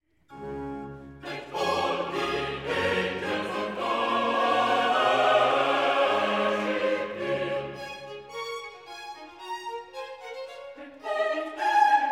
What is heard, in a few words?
Chorus Let all the angels of G; Handel; Messiah, HWV 56